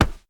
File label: Block